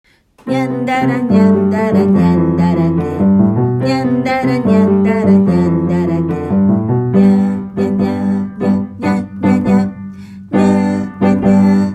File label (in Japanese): 楽曲 アルペジオ; にゃんだらけテーマ曲